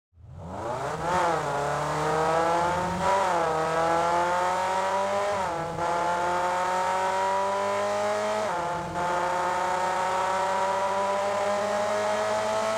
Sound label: Sports car engine acceleration and run interior